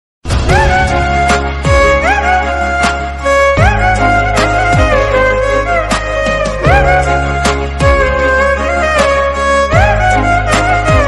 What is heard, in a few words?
sad feeling ringtone